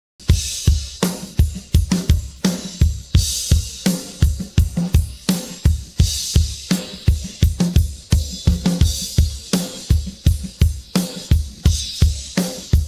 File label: pattern, drums